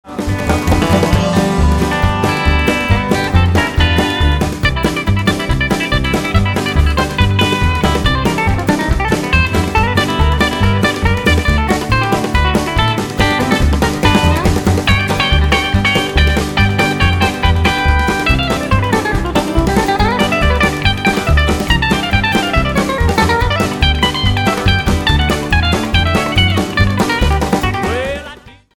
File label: Train Solo